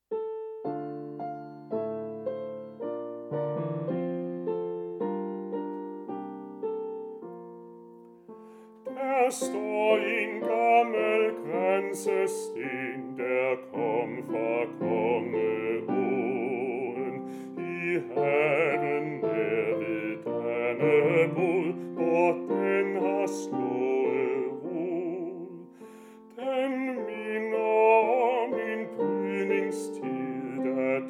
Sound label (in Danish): bas; der staar en gammel graensesten